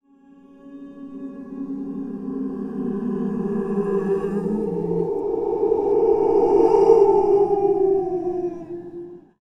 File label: Voice In Air, Strange Ambiences; Sonic Foundry (Sony Creative Software); The Electronic Music Manuscript Collection